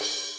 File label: crash behemoth